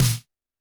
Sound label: Snare, Roland TD-20